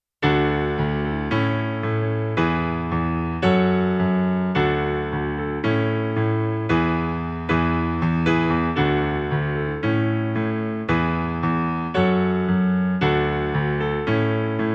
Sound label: O What a Glorious Night Tenor w Lead; christmas choir